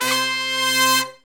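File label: brass shorts, Brass Sections, Horns; R&R Sect; Roland LCDP06 Brass Sections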